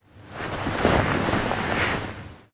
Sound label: Rustle of Cloth